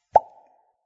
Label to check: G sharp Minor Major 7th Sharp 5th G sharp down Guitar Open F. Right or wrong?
Wrong